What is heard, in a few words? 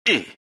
pain; tinker